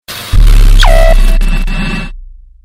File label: appear; c-moon